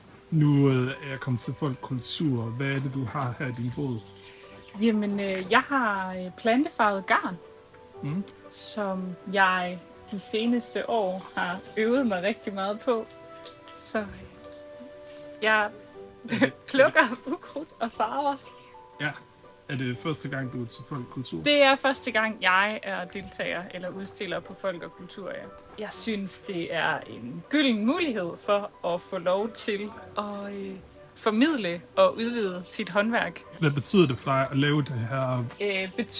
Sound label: music